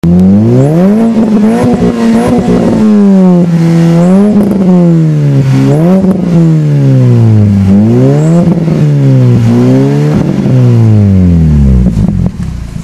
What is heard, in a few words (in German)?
auspuffsound tuner, mini cooper cabrio